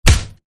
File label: punch; kickbuttowski; mellowbrook mayhem